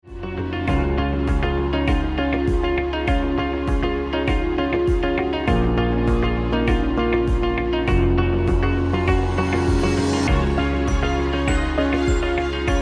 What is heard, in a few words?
Motivational